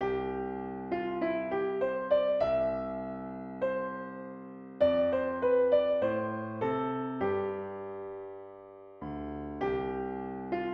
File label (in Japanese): ピアノ